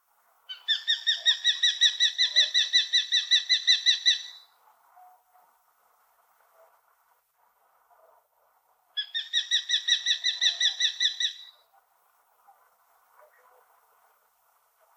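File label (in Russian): obyknovennaya pustelga golos, common kestrel voice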